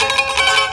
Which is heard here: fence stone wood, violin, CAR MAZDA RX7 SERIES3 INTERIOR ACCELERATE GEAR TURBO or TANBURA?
TANBURA